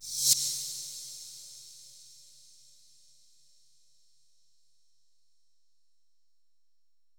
Cymbals Reverse Crash; Vengeance Samples

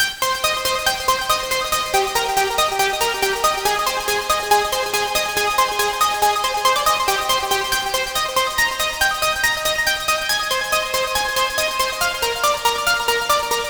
Sequence, 140 BPM; Pitched; Vengeance Samples; Vocal-Hook Kits; Song Kit; Break The Walls

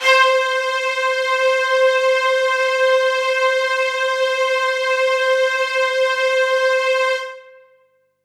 Synths HiString C; Vengeance Samples

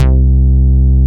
Basses C; Vengeance Samples

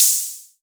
Open Hihats, Cymbals; Vengeance Samples

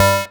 Synths C; Vengeance Samples

